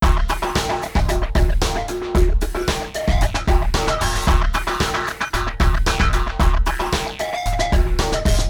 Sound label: hi health groove; FMOD Example